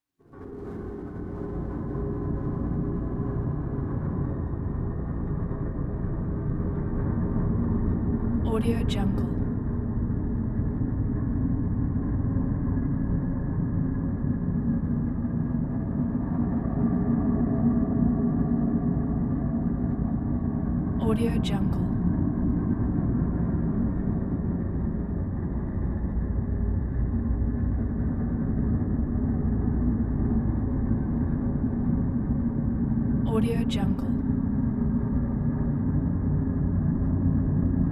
creepy ambient

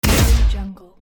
futuristic weapon; andrewsound83

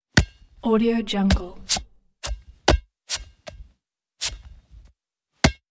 basketball pass

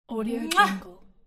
short lips kiss

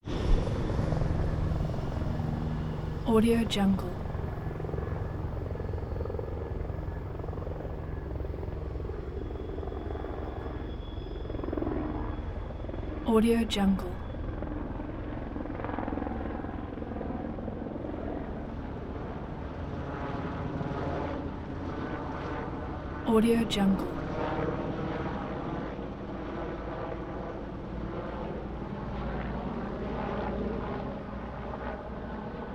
helicopter circling in fly by; prosoundfx

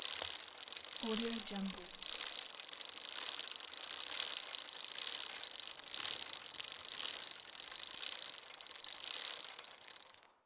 bicycle gears

